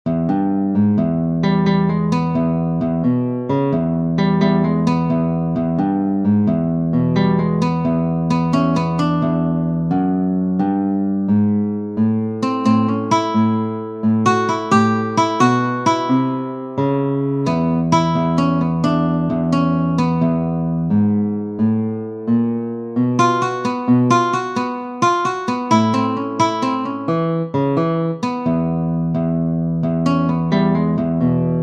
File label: blues, guitar